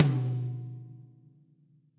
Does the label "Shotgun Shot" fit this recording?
No